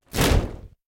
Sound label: body impact wood